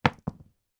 household childs fishing net drop on carpeted floor